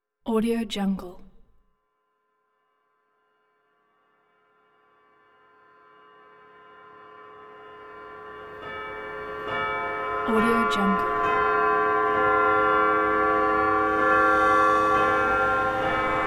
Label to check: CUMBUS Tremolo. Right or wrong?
Wrong